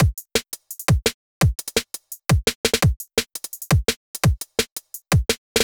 loop

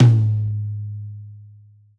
tom mid

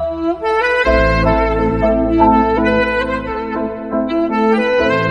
violin sax; Riffusion-Melodiff-v1

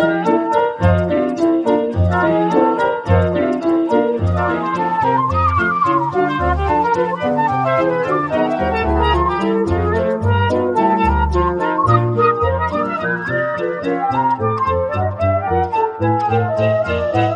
piano flute; When the Saints; Riffusion-Melodiff-v1

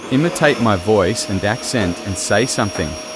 Vacuum Cleaner

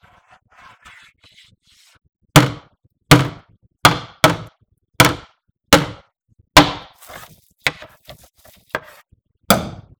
plastic

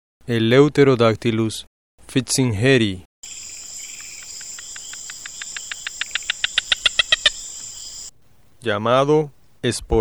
Carolina Chickadee, Poecile carolinensis, Aves

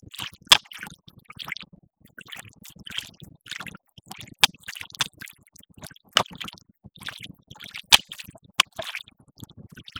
water

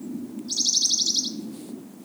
Northern Mockingbird, Mimus polyglottos; Aves

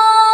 Acapella ver. Loop

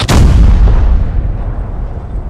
fire; tankwar

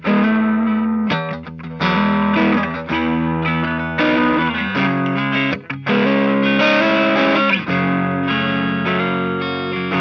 electric guitar

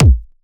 KICK, fat ass kick

